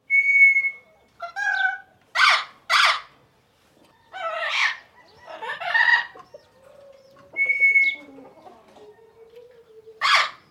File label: bird parrot talking; animals; felix blume